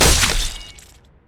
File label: dragonstrike; LoL; jarvaniv